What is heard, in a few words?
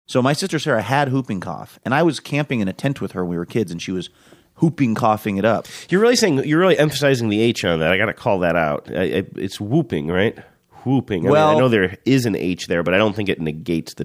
whooping vs whooping cough